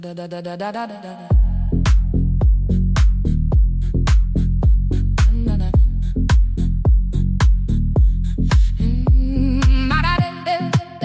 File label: Deep House